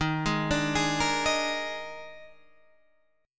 D sharp Major 7th Suspended 4th, D sharp, down, Guitar, Half Step Down